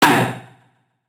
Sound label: cowboy pain